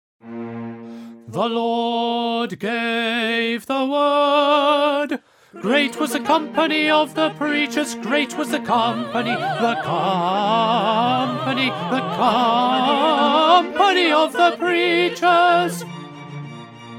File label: Tenor; Handel; Messiah